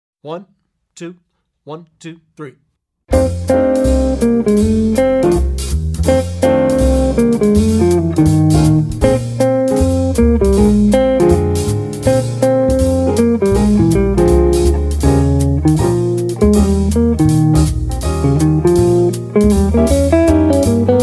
With Soloist

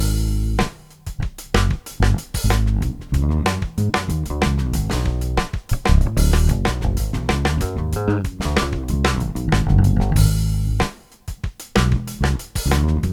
funky with bass line

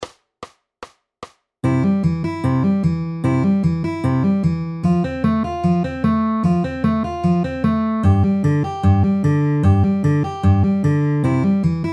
C F G C downward arpeggio ring ring at 150 bpm

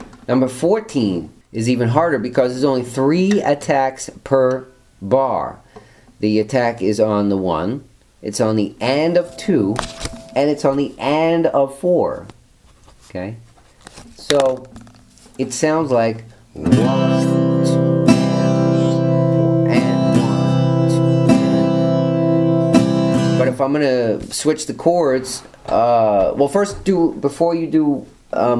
strumming

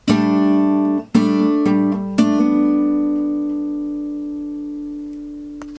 e shape major embellishment lick

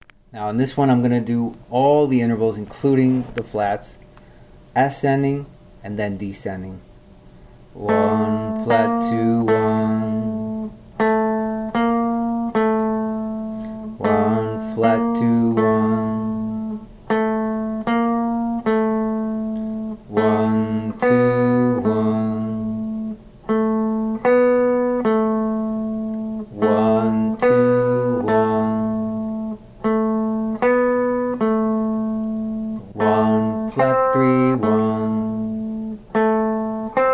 ear training intervals above and below octave

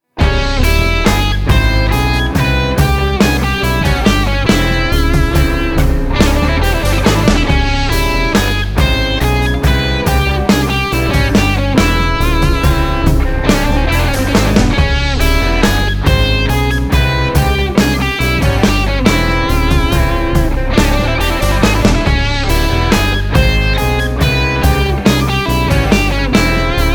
enigmatic minor

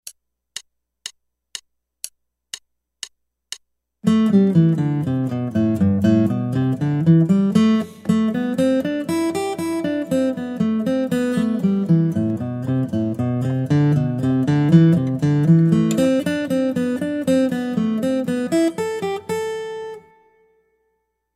Guitar

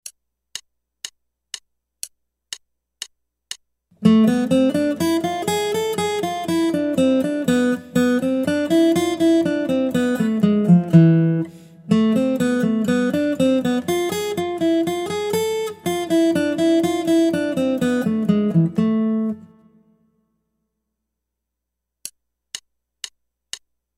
Guitar

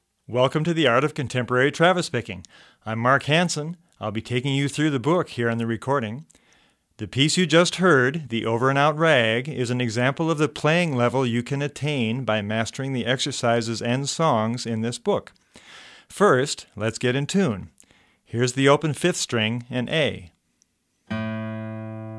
travis picking

